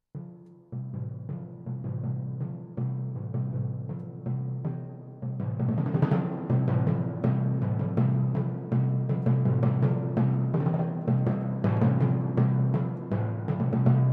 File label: timpani